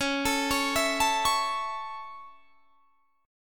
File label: Db Minor Sharp 5th Db; arpeggiated